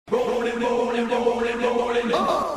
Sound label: rolling; cave